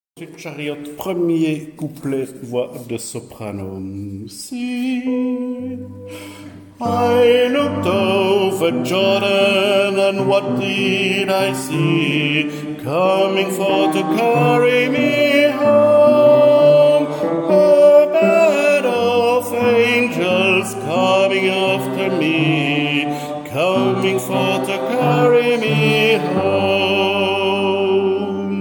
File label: swing low swing chariot soprano 1er couplet